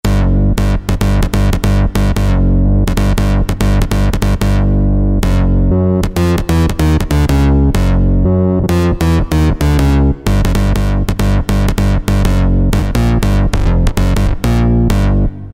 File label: BASS